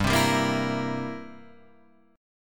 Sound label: G 6th Suspended 4th Sharp 9th; G6sus4#9 strummed